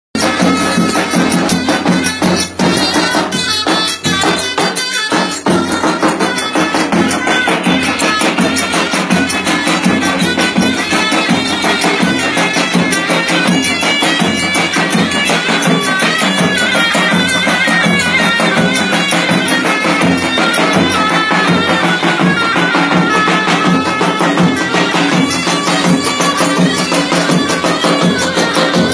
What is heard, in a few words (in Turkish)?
davul zurna; istanbul